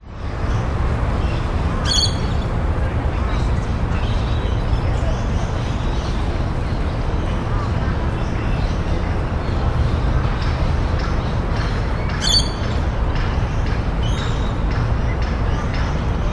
brisbane cbd botanic gardens by river people birds distant city hum construction